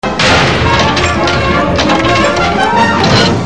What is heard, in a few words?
tower lockup; Animaniacs